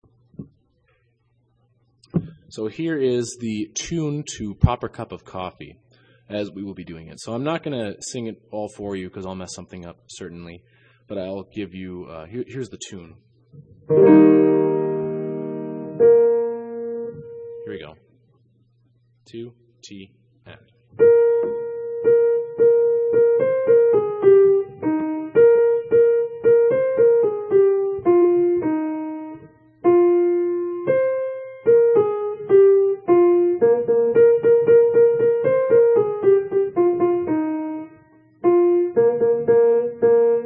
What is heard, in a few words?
Coffee Tune